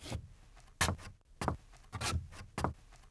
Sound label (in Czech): preslap drevo; Mafie 1